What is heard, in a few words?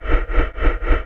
BREATH; CMP - Analogon